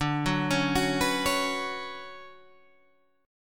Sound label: D Major 13th D down Guitar Standard; arpeggiated; JGuitar